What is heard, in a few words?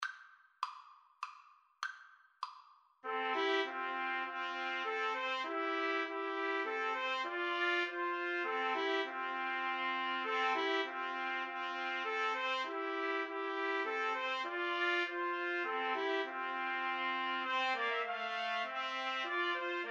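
trumpet, karaoke; Trumpet Trio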